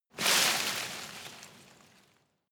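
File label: tree small leaves hit, garten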